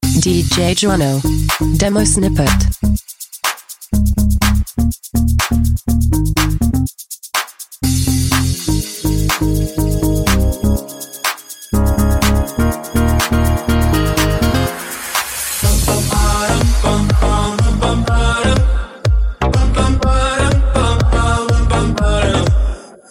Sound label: House